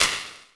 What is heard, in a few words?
Dist Rimshot, Percussion Hits; Zero-G; Soundclash; Acidized Wavs